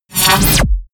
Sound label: sweep